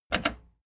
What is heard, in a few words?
plastic button slack click fast